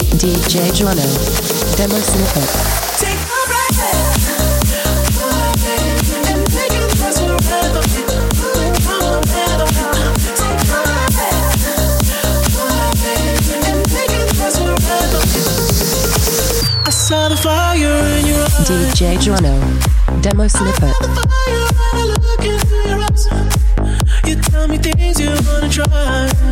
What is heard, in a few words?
130 bpm, Ab